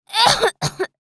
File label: Little girl heavy cough; gfxsounds